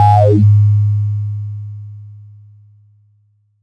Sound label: ppg sustained acid g 2, multisampled acid synth; instruments multisampled; synth keys; Jovica PPG